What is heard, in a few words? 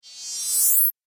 Naughty Fairy Magic Riser Spell